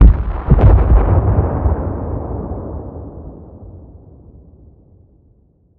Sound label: helicopter explosion distant; Rust